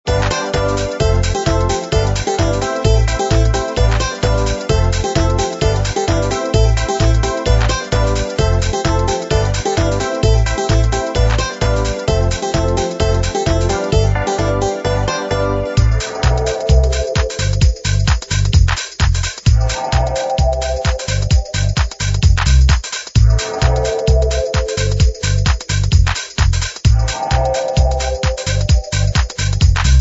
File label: Trancy Techno